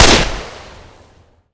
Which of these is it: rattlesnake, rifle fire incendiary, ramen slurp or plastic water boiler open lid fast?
rifle fire incendiary